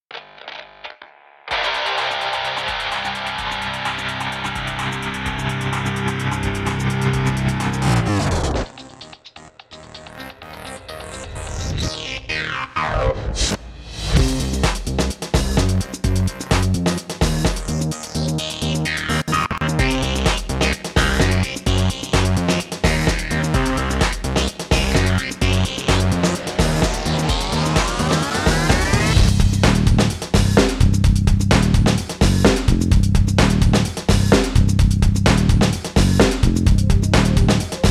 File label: techno rock